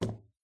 phone drops on wooden table; your free sounds

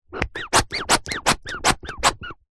leisure toy rubber duck squeeze no squeak

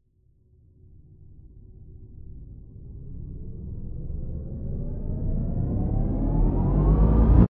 riser short subtle dark; sound design

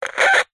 cb radio walkie talkie crackle beep button; technology; zapsplat

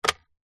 telephone landline plastic receiver pick up; household

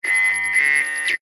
glitch digital

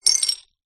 bottle tops beer metal caps x2 down on table; zapsplat

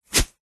sport tennis racket single swing fast whoosh

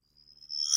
Morph Swell; bottlerocket

